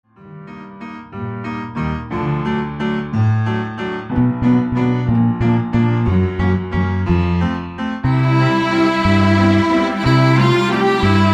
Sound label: Waltz